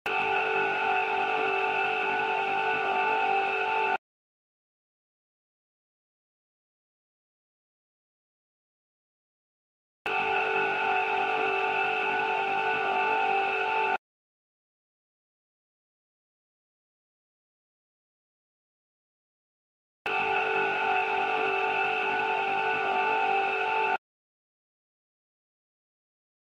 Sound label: Choir; Soup0.9 Online